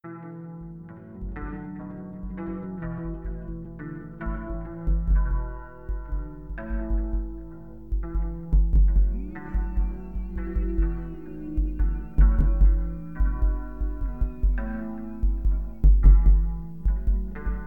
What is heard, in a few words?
Bass Noise